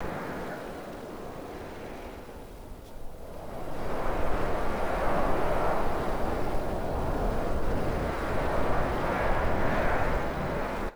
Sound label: gusty howling and whistling wind